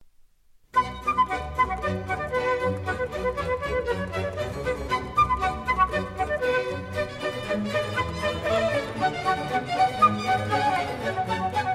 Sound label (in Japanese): バッハ：管弦楽組曲第2番 ロ短調 BWV1067; Bach; Orchestral Suite 2; Richter